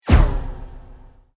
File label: Sweep Mechanical Fast